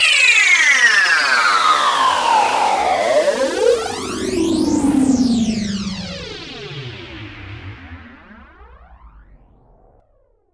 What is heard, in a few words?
Downsweeps